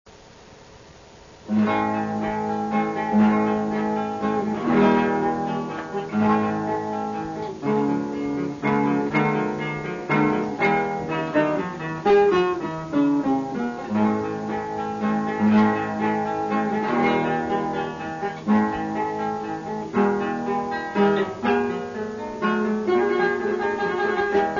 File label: romance; weber